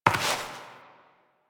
Step Dirt; Warframe; Koumei

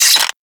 Generic Bow Load Arrow; Warframe; Foley

